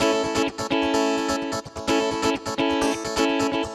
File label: Electric Guitar 128BPM A maj; Vengeance Samples; Electric Guitar Kit 1